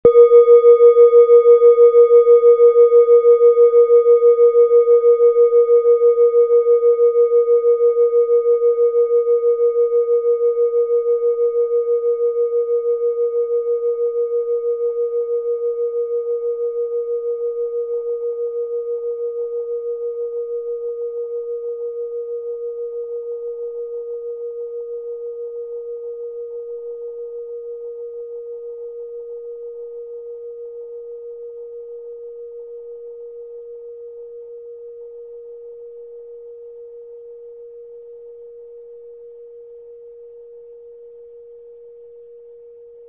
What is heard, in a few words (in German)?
klangschale bronze